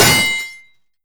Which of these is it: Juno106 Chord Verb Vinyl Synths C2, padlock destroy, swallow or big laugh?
padlock destroy